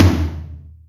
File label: Rock, Acoustic Tom; Yamaha DTXpress